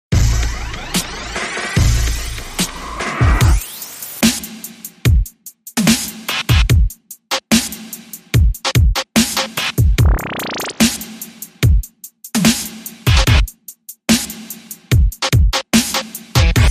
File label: Annoying Beat